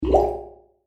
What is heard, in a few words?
Water Bubbles; Andrew Fleming